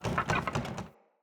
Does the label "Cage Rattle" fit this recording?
Yes